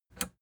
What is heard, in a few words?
plastic button latch release enable